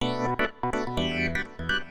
synth house 125 A; eJay; Keys

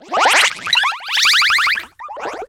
jump; darwinia; Darwin jump2

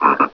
Frog; Widget Workshop; A Mad Scientist's Laboratory; Maxis

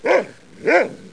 woof woof; Mr. Wonder's Greatest Toyshop on Earth

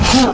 jump; QUAKE2; cyborg

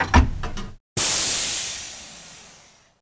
cab start

